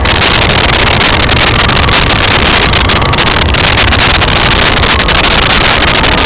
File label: pod steady lo loop